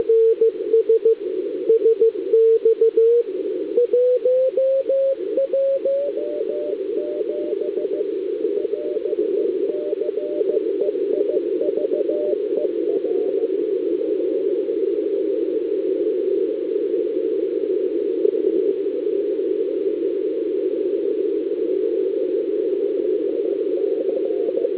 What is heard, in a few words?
No clicks mono